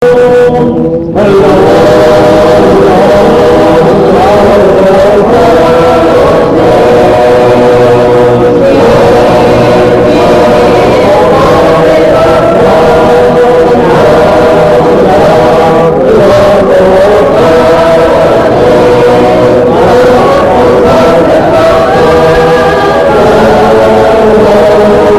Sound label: Soft Music; Chattahoochee Poplar Springs Bowden